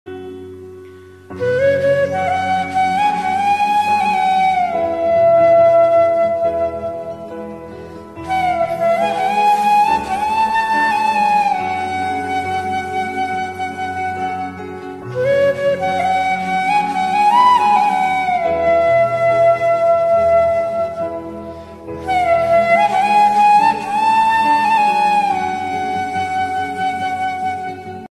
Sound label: sad ringtone flute ringtone